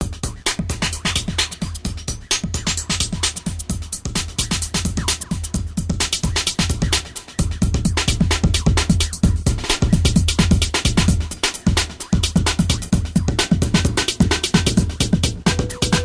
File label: drums